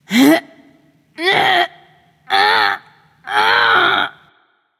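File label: grunt lift, female; smite; voicepack; hera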